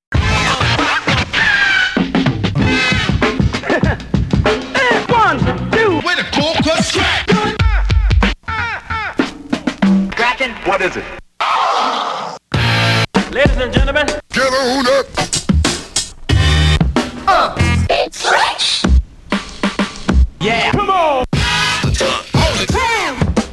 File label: breaks; Super Duck Breaks